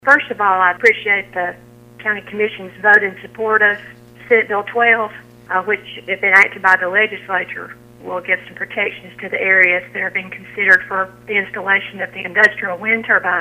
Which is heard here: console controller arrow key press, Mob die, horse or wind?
wind